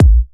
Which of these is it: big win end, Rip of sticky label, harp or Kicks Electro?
Kicks Electro